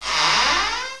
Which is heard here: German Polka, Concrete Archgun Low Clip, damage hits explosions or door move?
door move